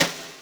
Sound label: snaredrum, Yamaha RM 50; Drum Samples; Snaredrums